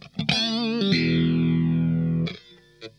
SQUASH LIK, Funk Guitar; 90 s Sample CDs; Zero G

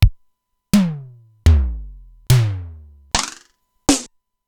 Simmons SDS-9 Factory

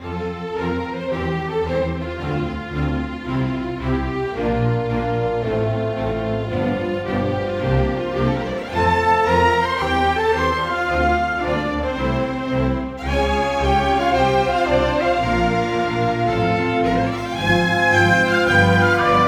Rock Pop, Orchestral; Sonic Foundry (Sony Creative Software); Orchestral Series VOL-4; Rock and Pop; Rock-Pop 08

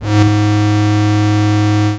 C3, Synth Hits; Zero-G; Soundclash Nu Dance Electro; Acidized

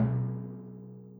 Timpani, Orchestral Percussion One Shots

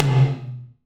Tom, Gated Reverb; musicradar

